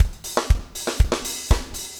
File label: 4 on floor 120, loops, 120bpm